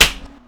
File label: Hard Hit